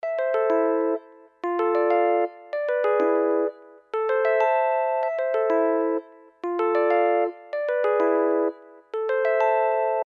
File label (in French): piano